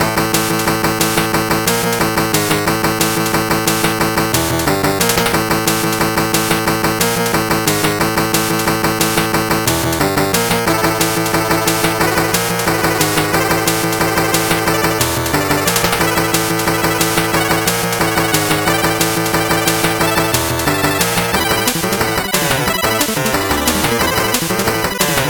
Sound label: 8bit bgm normal